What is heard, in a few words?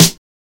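snare drum, single, in big space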